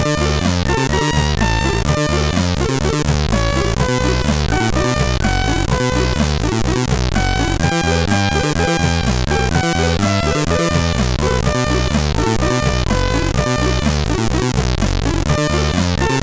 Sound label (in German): Melodei, CSG8580R5; sid